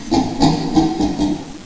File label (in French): gorille cri